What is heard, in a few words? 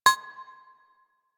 Bridge Comp Beep; Warframe; Liset; Hub